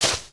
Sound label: grass, human step